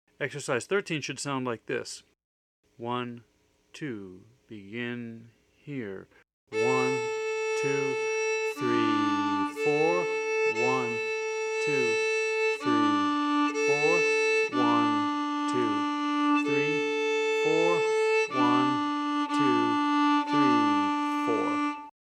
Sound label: Open String Exercises A D Quarter Half